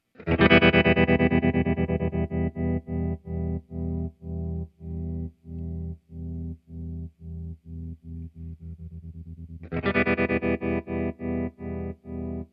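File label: Two Speed - Asymmetrical waveform. Full depth. Trem is in two-speed mode and is switched back and forth between two different rates.; empress tremolo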